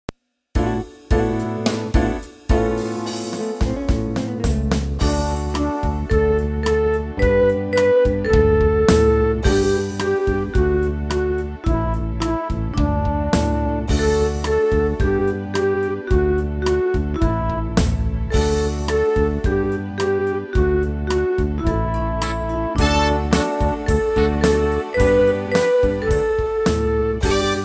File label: Twinkle, String Songs